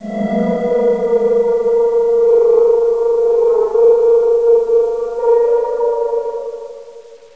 tone; thief

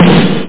snare drum

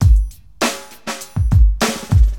DM LOOP, HipHop, Drums; LiveAct Pool; AcidJazz Funk

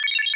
menu; Alpha Centauri DEMO

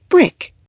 brick

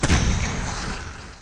boom; bombermania

